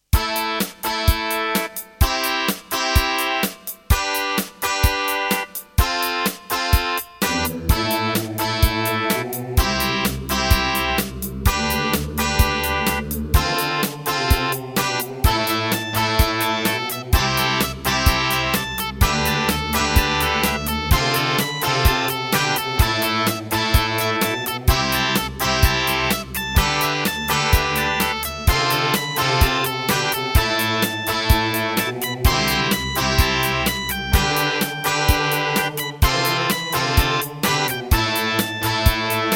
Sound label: Anteg EasyMIDI EM-100; raptor call of the shadows